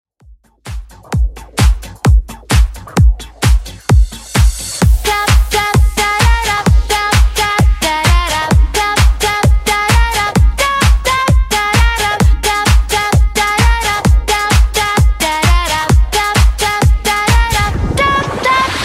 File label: 90s Redrum 130 bpm